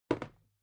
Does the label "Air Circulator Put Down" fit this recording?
Yes